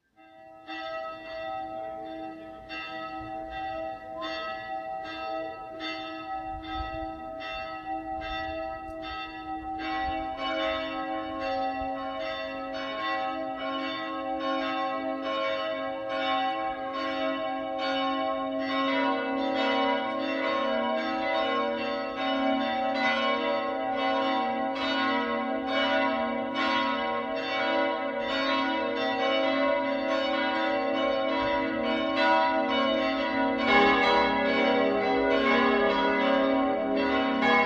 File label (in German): Hochfest Vollgelaeut, Domglocken